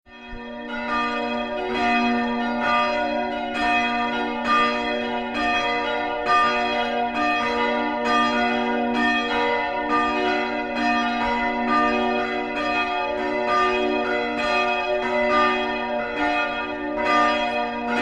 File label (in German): Glocken; Wega